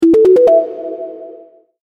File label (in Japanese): 完了